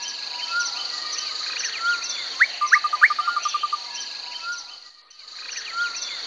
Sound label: WOOD; Shadow